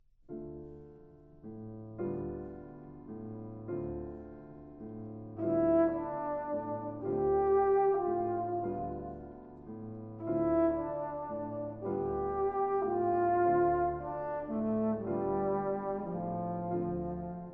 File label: Divertimento For Trumpet, Trombone, & Piano, Andantino